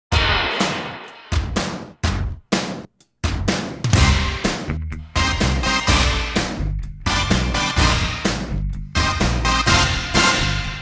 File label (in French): Musique Film